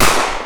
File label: 9 mm gunshot; Gunshots; GMTKGameJam2020